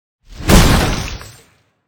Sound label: attack wood; effect